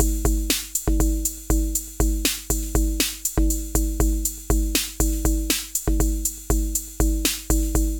606 Beat, 120, Drum Machines; 606 Strangeway; Ableton

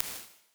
toms gated; Convolution Reverb; IRs; Made for Drums; Ableton

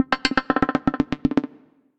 Karplus Pulse, Synth Rhythmic; Ableton; Glitch and Wash

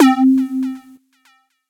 Lazer Mallet, retro synths; CZ; Ableton; Factory Packs; Phazeform Vol 1

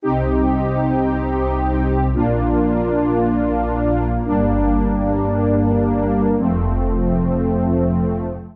Super 80's Soundtrack Pad (Cm) 112bpm; Music Loops; Super 80's MK Soundtrack Pad; Ableton; Factory Packs; Singularities

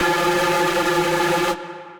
Effects; Fixed Luck

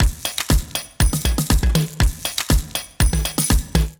Toy Club Kit, Drums; Ableton; Factory Packs; Vibrations; ModeAudio

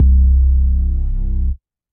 Sub Data, Bass; Ableton; Glitch and Wash

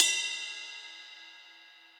Ride Bell Steely Tight; Drums; Drum Hits; Standard Hits; Ableton; Session Drums Studio

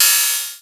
FM Ride; Drums; Ableton Foundation FreePacks

